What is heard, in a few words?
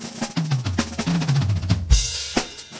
Drum Solo; TSMtoolbox